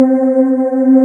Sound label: VOICES, Roland JD8000; Sound & Vision - Gigapack I CD 2